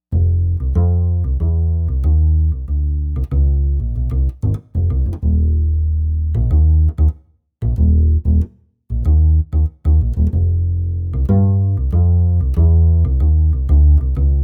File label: jupiter-80 acoustic bass